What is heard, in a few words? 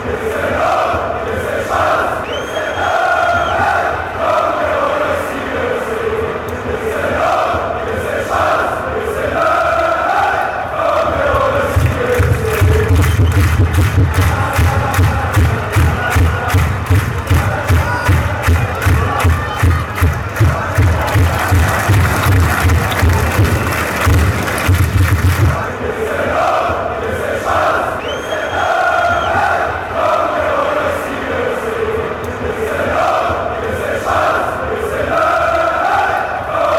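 red black, football chants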